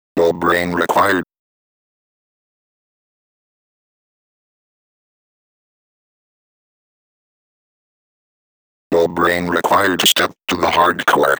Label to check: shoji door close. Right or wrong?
Wrong